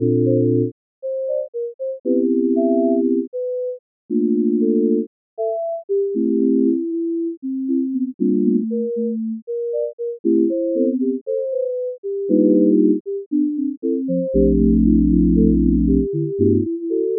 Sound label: music, Jazz Solo with LSTM; RNN